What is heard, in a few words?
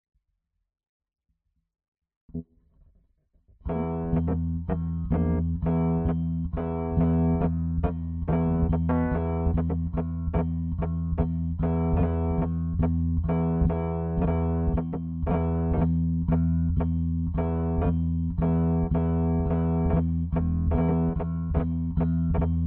Lead EP Unison